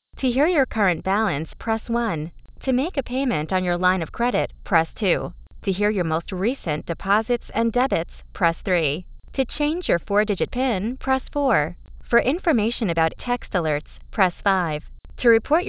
Menu Fast

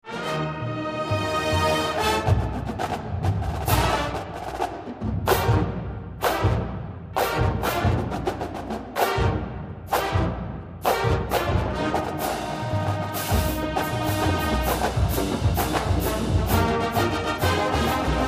Marching Band; Michigan Marching Band

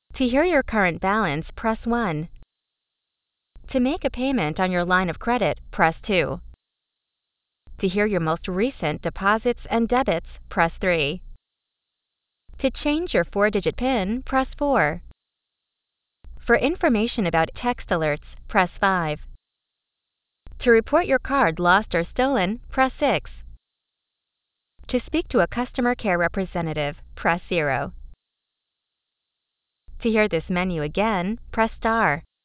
Menu Slow

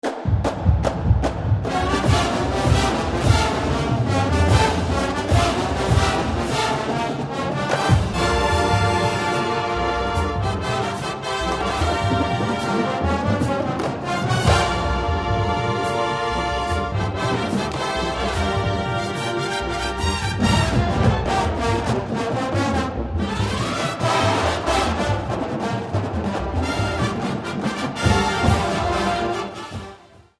Marching Band; Michigan Marching Band

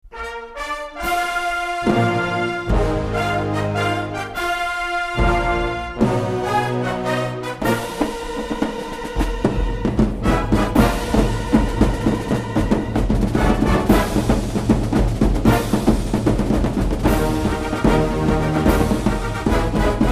Marching Band; Michigan Marching Band; halftime

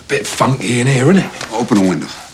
funky

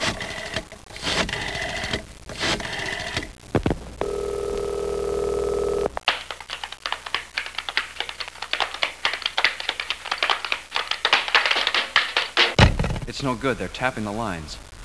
linetap

